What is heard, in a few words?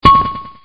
sound hit